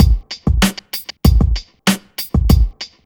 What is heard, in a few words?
beat, Hip Hop R n B; USB Soundscan vol.19